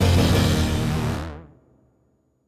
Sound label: curse melody